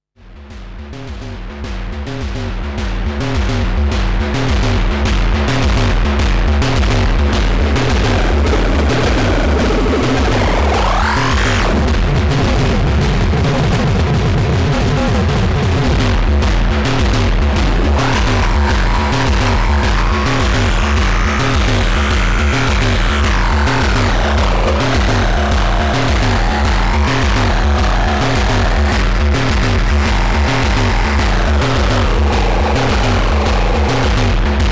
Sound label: Moog DFAM